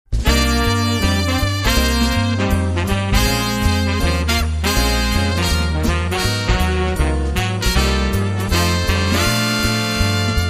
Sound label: Jazz Brass